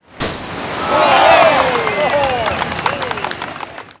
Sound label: champagne bottle smash and crowd cheering